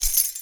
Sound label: sistrum; Kenya Sistrum